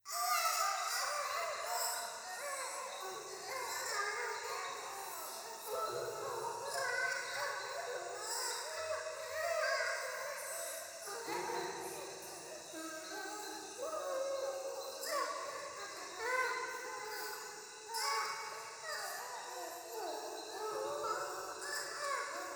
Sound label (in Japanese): シャブ喘ぎ